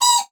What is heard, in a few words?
Beep High Pitch